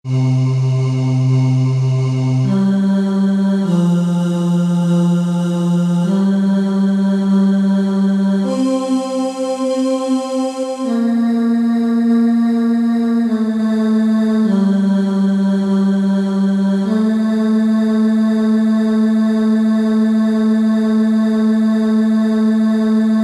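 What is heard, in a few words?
jazzy, Baritone, barbershop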